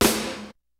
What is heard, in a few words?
kicks snare, kid; Kid Nepro; Elite; KICKS SNARES